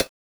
Cowbell Ting; Genesis

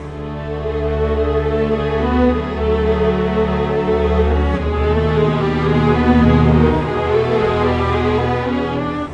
Cinematic Strings, Loop Samples, Orchestral; Sonic Foundry (Sony Creative Software) - Crimson Blue and Fabulous Horncraft 4 RnB

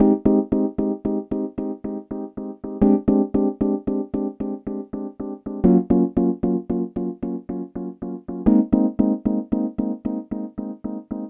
Rhodes

